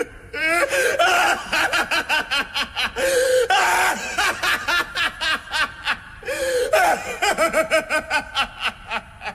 laugh, italian version; kiras

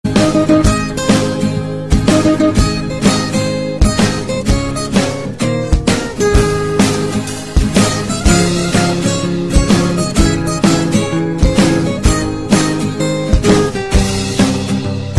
theme pop rock guitar cover; woody woodpecker; tema pica pau

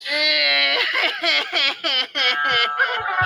turtle crying

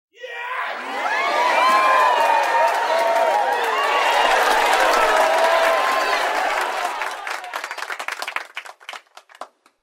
applause; eric andre

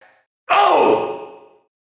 punch cry; c-moon

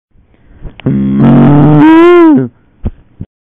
ain

